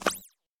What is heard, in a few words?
jump plastic; fallguys